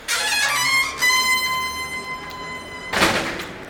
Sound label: Squeak Bang